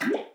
paint trickle; Portal 2

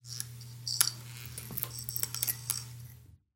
light flicker, ambient lights

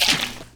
fs fm paint, footsteps; player; Portal 2

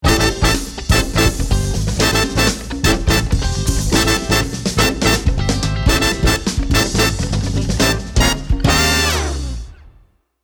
round ten sec count, music

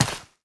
step, footsteps, player, mud

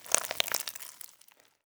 squeeze dry, gland; creatures; headcrab classic

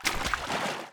footsteps, slosh; player

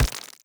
step layer, headcrab reviver, steps, creatures

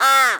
squawk call, crow; critters

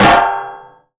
vent step, headcrab reviver, creatures

